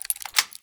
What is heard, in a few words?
use; keys; Escape from Tarkov